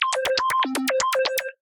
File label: Liquid Bassline, Synth Rhythmic; Ableton; Glitch and Wash